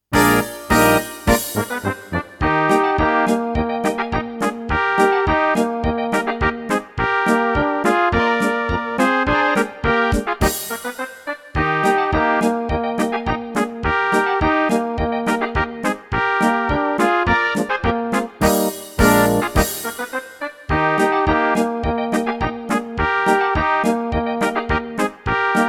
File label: polka